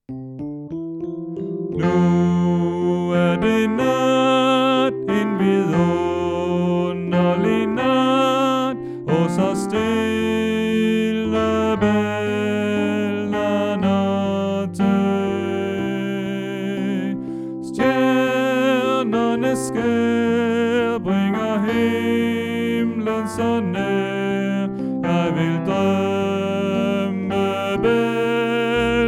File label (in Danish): Bas